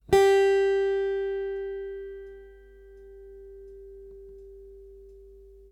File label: Note G, guitar